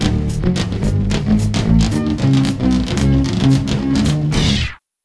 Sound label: Lose; Stone Age Lose; Tanktics 1999 PC